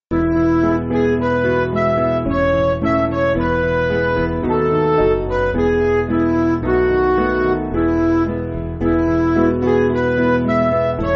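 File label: Music Backing Files for Churches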